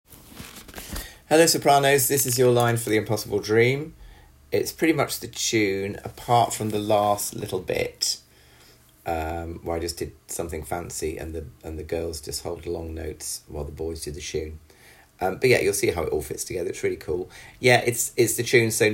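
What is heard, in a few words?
SOPRANOS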